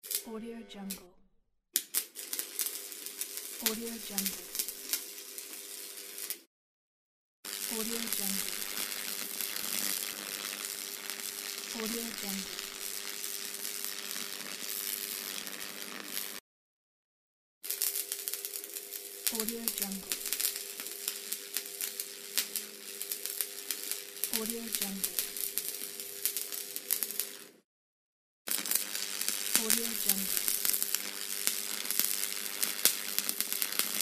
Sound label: arc welding set